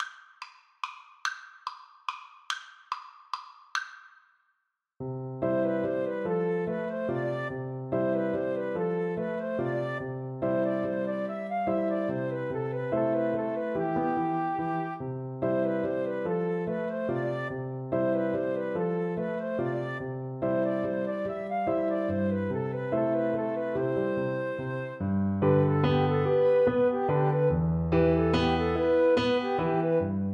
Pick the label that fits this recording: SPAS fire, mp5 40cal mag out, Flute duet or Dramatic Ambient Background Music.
Flute duet